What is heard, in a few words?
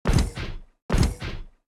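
Sound Monster Walk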